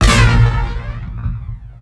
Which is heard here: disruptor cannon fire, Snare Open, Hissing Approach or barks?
disruptor cannon fire